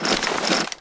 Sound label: plant start